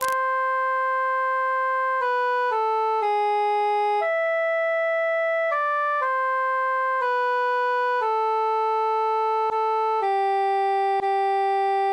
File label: cantus solo; weep o mine eyes